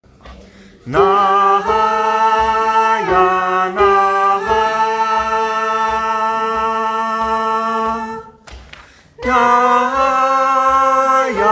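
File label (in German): Sopran 1 Takt, gospel choir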